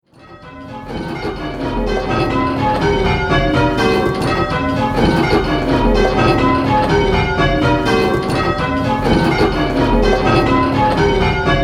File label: 8-Bell, bells; What place is the treble in?